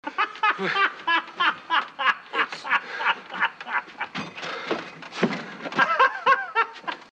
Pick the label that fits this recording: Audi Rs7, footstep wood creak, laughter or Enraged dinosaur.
laughter